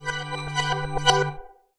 Skill Key Close; UI

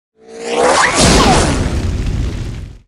Dragon diffuse blow

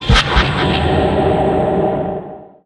attack speed up

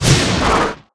attack

